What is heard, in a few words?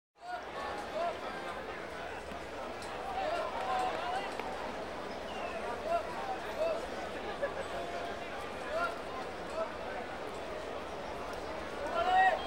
Baseball Crowd; KMP Film Video Sound Effects